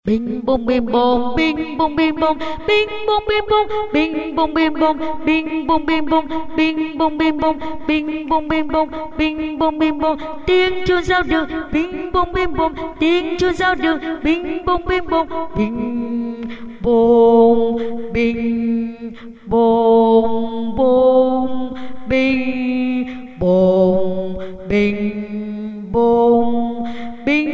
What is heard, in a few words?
basso